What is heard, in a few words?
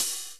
open hh